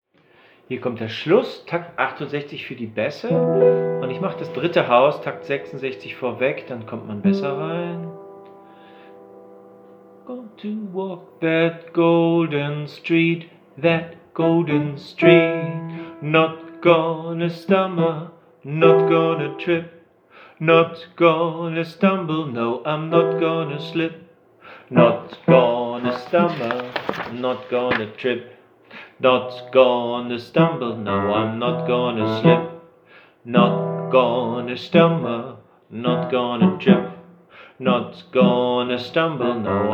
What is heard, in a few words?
Bass